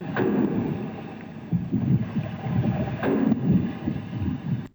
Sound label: Water; BERZARRESOUNDZ